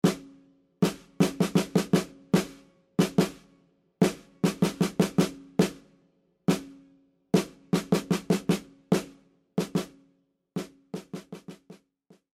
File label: drum beat